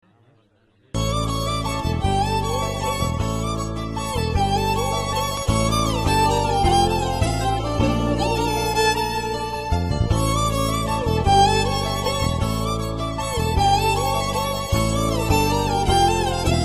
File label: Bgm Ringtone, Tamil